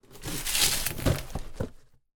foley gift present wrapped box place under synthetic christmas tree